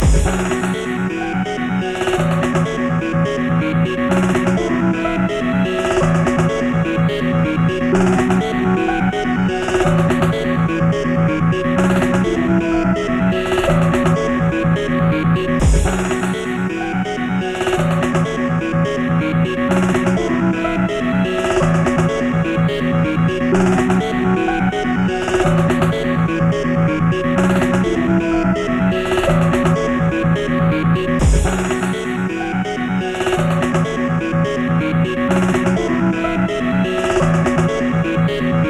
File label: techno loop fast